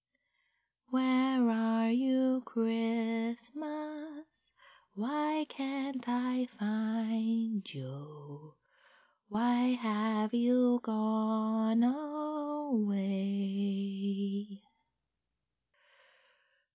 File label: Singing, cute, Christmas; [Cindy Lou Who]; [The Grinch]